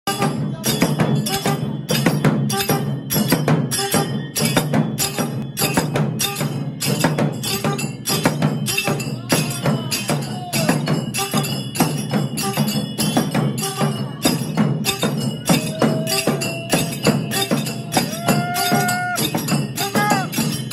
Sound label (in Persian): Tabl Senj Moharram; بی کلام